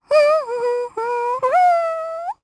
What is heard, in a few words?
Hum, jp; Pansirone; kingsraid; voices heroes